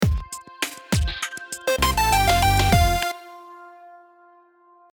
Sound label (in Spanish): AUDILOGO LARGO; Monterrey